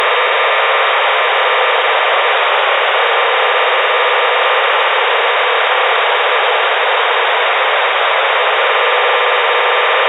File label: TETRA PowerTrunk